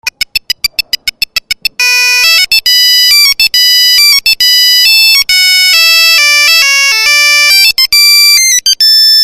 theme siemens, Polyfonisk musik; midi

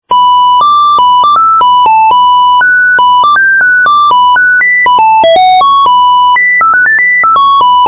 siemens; midi; Polyfonisk musik; Film

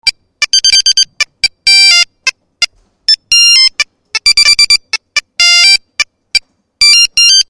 siemens; midi; Polyfonisk musik; oldies

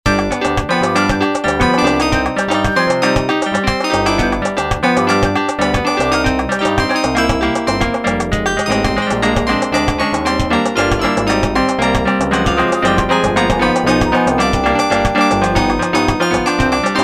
Rumba De La Pasion, Latin

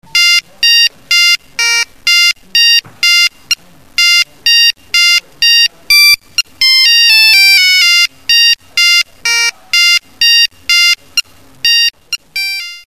siemens, Polyfonisk musik; midi

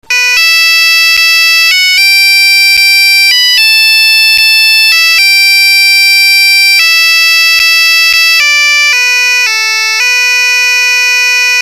siemens; midi; Polyfonisk musik; oldies

